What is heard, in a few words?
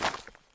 gravel land; Golden Crayon Sounds